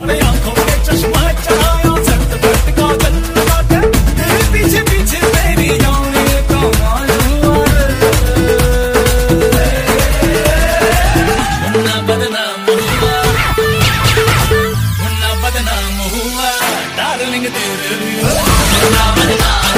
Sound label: sad ringtone; whatsapp; bollywood ringtones